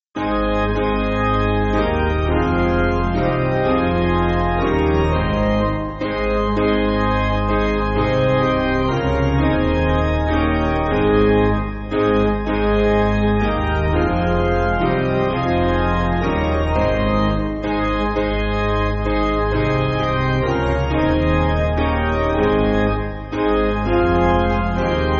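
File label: Piano, Music Backing Files for Churches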